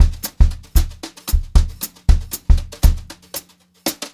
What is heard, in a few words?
Brushed Drum Loops, JAZZY, 116; USB Soundscan vol.39; AKAI; 90 s Sample CDs